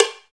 COWB, Cow Bells, Roland

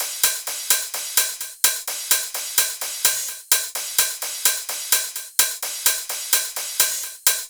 Loops 128BPM, Drumloops

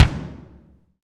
BD, BD REAL

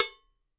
COW MU; Sampleheads; NYC Percussionworks; 90 s Sample CDs

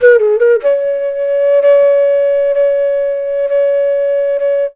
FLUTE C03, Indian Traditions; USB Soundscan vol.45; AKAI

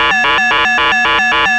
Moog C#4, rare synthesizer; Hollywood Edge; Giorgio Moroder Rare Synthesizer Collection

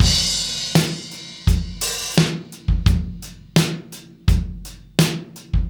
Testos 084; Zero-G; Monster Beats

